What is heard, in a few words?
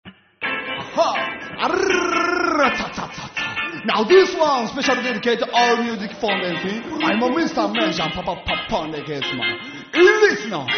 Trap mix